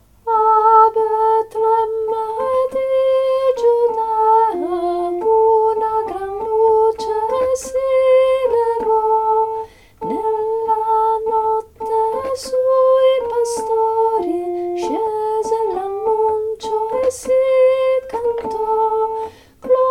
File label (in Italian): SOPRANI